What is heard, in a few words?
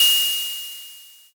ride cymbal